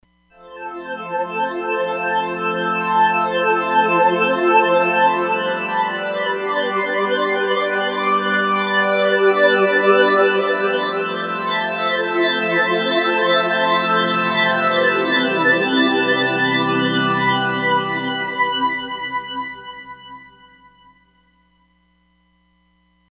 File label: Organic Pad